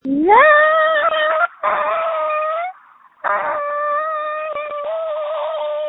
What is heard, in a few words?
Scream